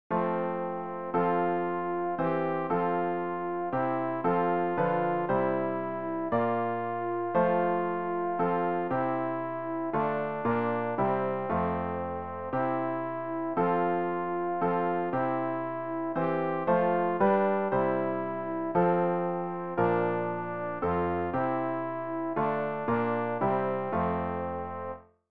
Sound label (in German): alt, Übehilfen für das Erlernen von Liedern; ref gesangbuch; rg 156 herr jesu christ dich zu uns wend